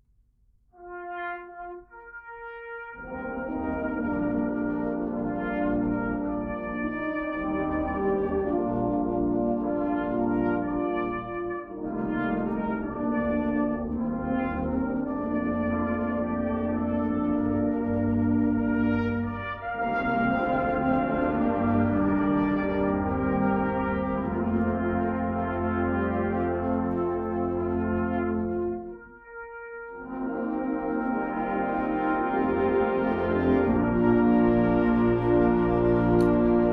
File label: Taps